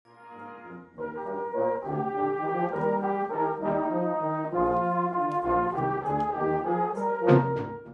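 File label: Kinderwalzer, Brass Band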